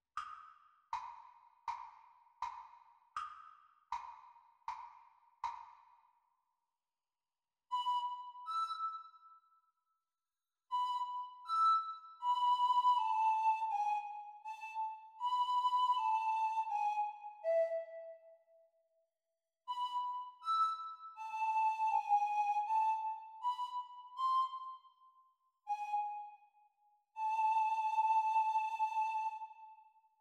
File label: Descant Recorder Practise speed